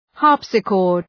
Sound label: harpsichord